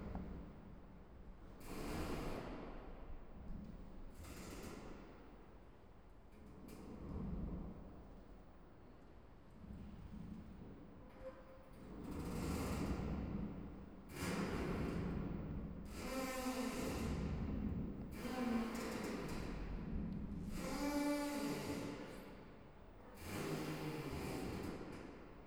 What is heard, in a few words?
Imagined Immersed and Dissolved Soundscapes